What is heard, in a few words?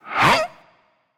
grunt jump; smite; voicepack; janus